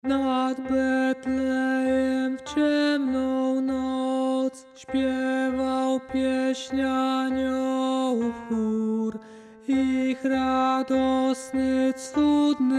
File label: tenor; Nad Betlejem w ciemna noc